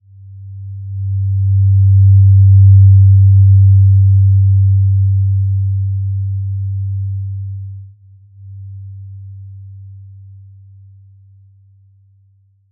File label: Simple Glow G2, healing soundscapes